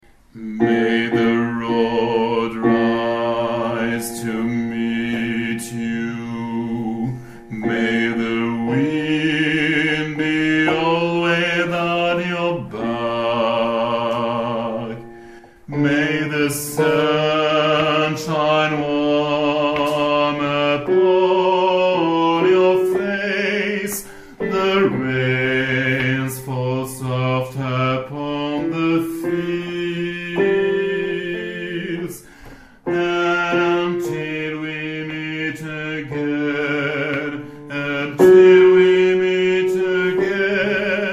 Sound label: Basses